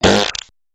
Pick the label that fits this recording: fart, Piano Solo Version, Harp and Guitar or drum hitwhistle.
fart